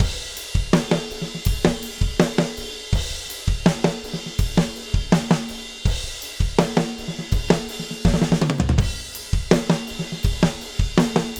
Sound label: drum